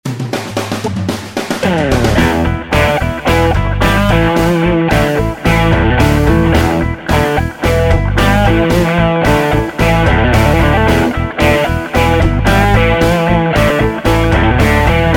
DeVille Crunch; Overtone2

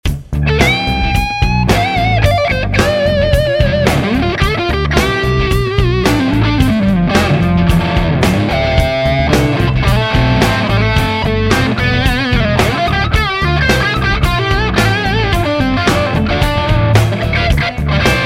Wizard 6V6, Les Paul

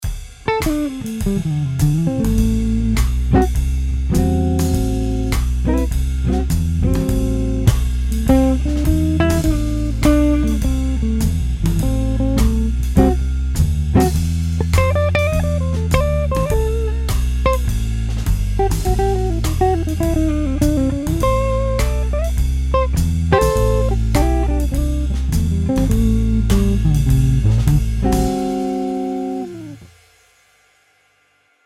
Clean Jazz

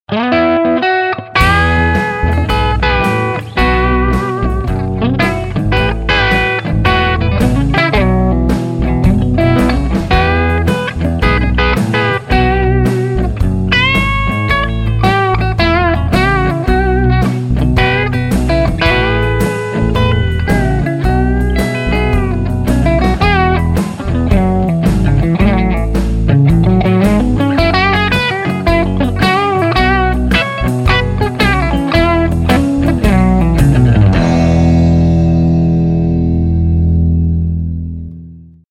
6V6 Telecaster Crunch

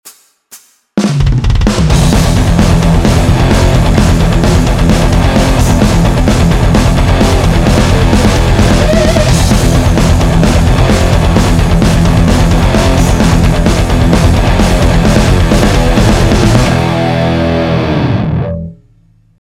Boost

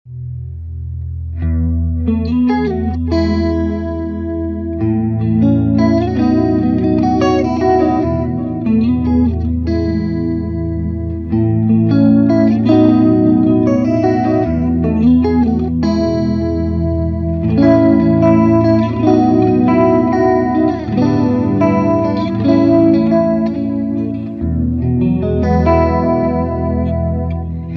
Clean Chorus

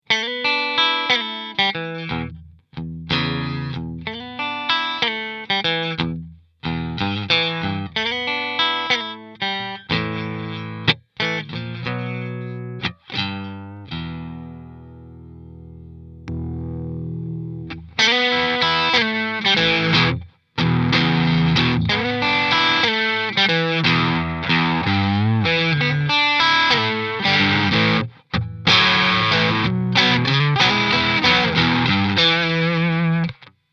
Stratocaster Blues

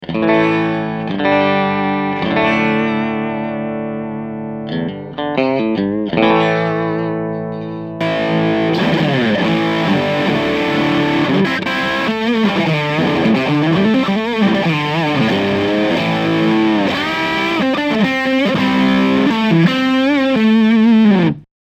Stratocaster; Mercury Box

